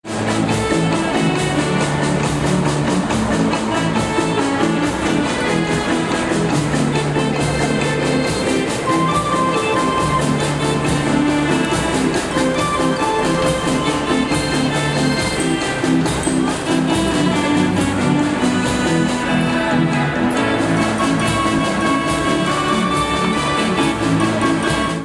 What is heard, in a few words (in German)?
leipzig hbf drehorgel orchester